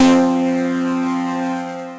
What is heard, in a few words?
Disto 5th, Synth Lead; Twenty; Ableton; Glitch and Wash